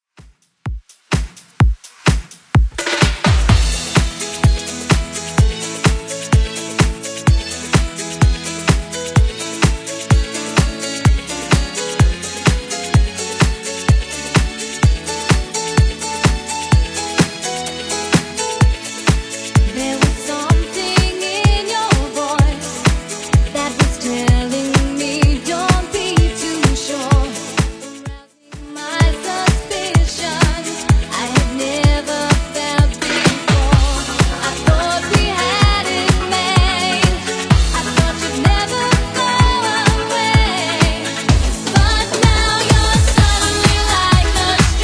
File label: 90s Redrum 127 bpm